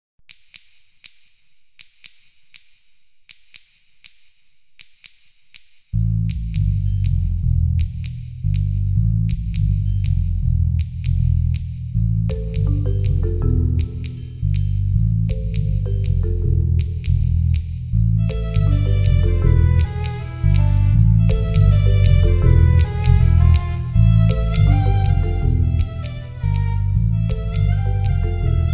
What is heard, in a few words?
Funky Clickin